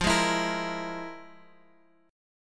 F Minor Major 7th Double Flat 5th, F, Guitar, Open F; FmM7bb5; strummed; 17,15,16,17,x,x